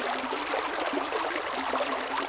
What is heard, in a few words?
Water